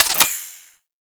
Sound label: Modular Secondary Clip Mech; Warframe; Weapons; Modular Guns; Clips